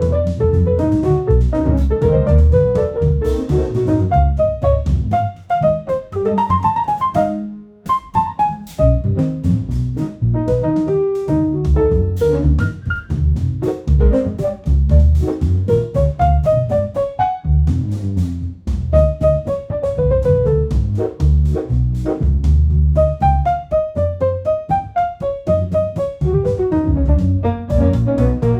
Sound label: comedy jazz slow